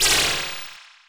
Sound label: Sci fi